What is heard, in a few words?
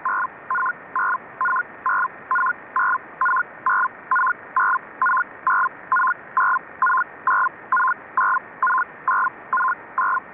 SITOR-A SELCALL; SITOR-A selcalling other station; FSK-systems